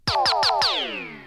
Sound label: topformat maxi jingle single